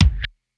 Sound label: BD upper puncher, DRUMS; Polyend; Legowelt